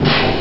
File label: charge, weapons